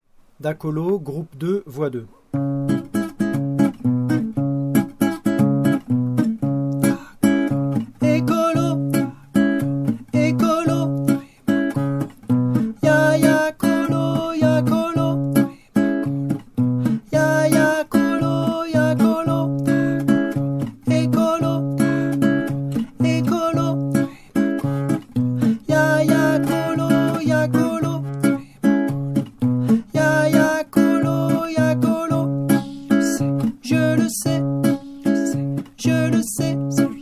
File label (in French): Voix